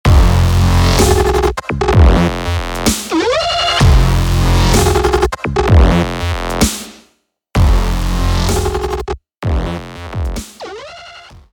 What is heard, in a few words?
techno